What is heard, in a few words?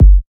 Bassdrum